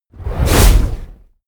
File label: whoosh fire noise blast medium